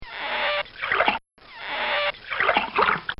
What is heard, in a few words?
Squeak, Splash; Funny Musical; recorded in a custom built sound booth; Bobby Cole